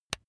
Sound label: razor attachment mount